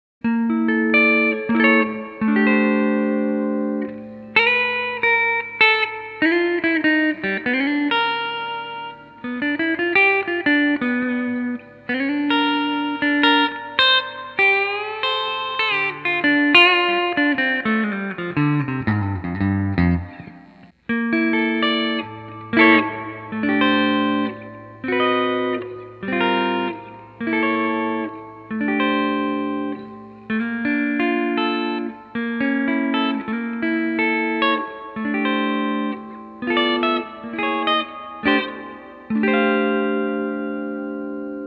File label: Add 9 Guitar F